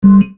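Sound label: sound transfer close; Nokia; 6270; Series 40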